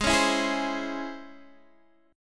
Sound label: C sharp Minor Major 7th, chord